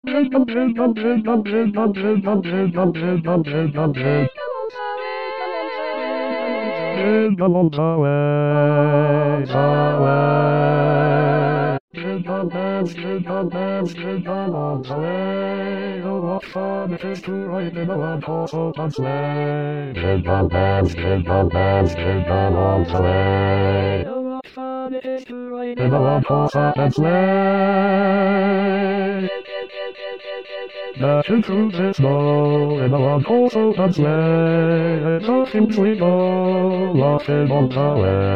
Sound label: Jingle Bells 6 voci bas